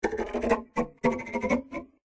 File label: instrument nukulele lead